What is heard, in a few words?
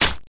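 knife hit; Caesar 2; DOS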